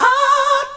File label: VOX SUNG; AMG Megabass REMIX! VOL-2